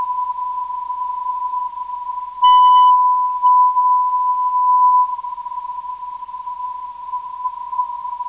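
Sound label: T 419kHz, non-directional beacon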